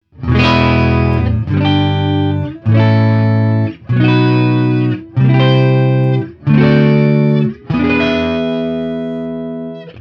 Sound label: alumin neck clean, strat